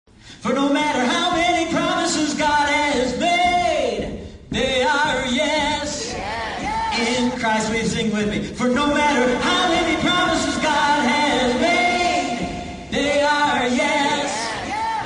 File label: Sing Song